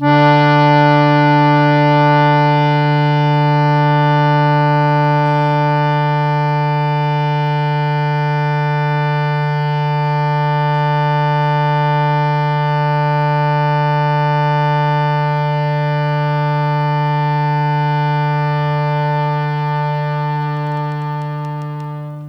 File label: harmonium